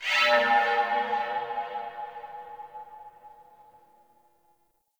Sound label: Tech Flow Texture Med, Corpus Ship ambience; Textures